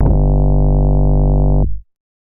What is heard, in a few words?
100 Monster 808 Sauce Alien 808